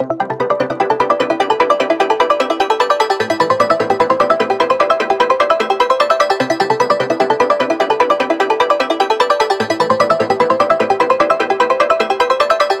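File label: synth loop dream state 150 E minor; Virtual Riot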